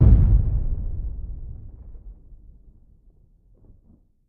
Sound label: Cannon Blast Thud; shots